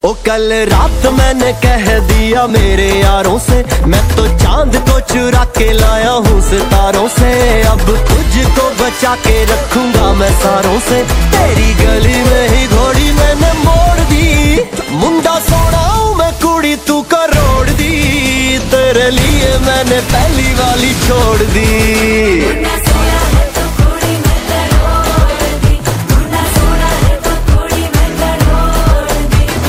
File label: hindi ringtone